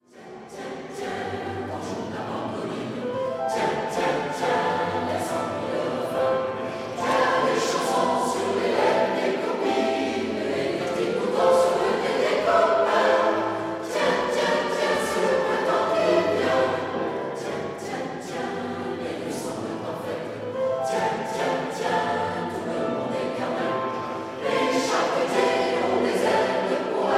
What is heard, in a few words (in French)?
Chorale; Chorale de REDESSAN